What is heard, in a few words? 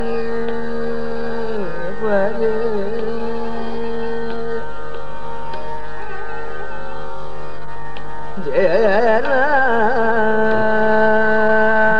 Yaman, hindustani raag